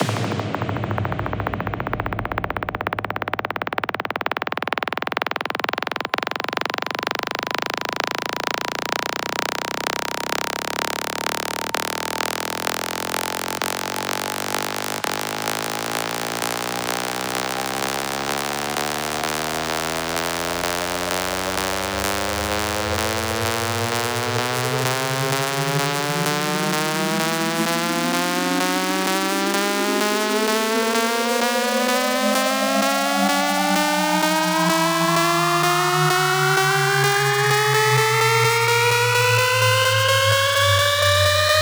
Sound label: 128BPM Dubber, House Electro Fills; 24Bars; Vengeance Samples